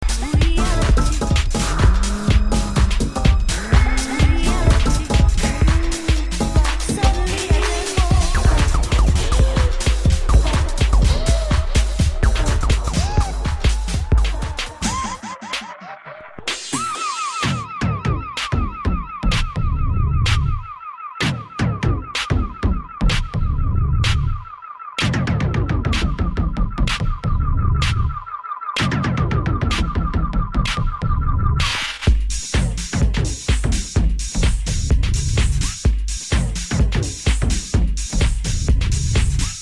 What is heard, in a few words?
In The Box (Drum Track)